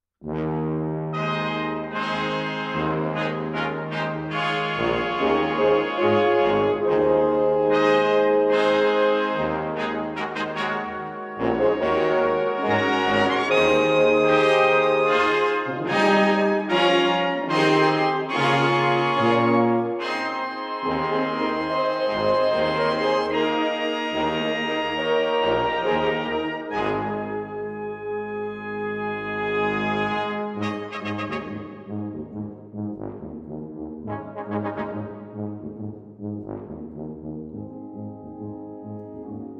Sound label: Fanfare And Allegro On Doxology, brass